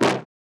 Horn shot C

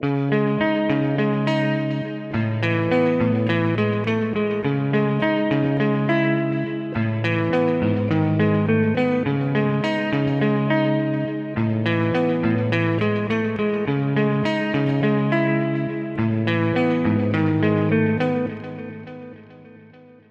MUSIC GTR LOOP 104 bpm WET Key C m

